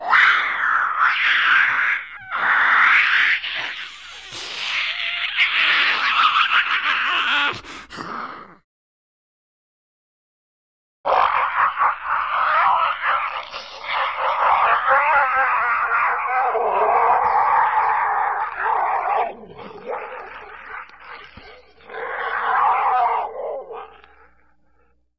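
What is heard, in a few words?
sounds of worms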